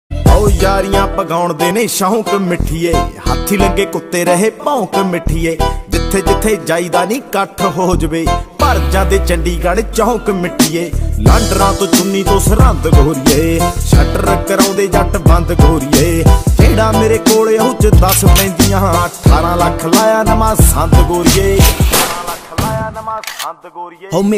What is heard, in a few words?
Punjabi Ringtone